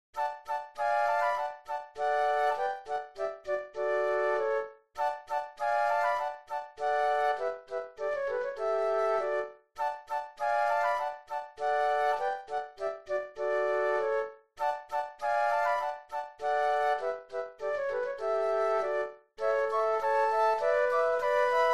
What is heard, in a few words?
Flute Trio; classical